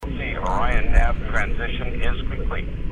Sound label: complete; alunizaje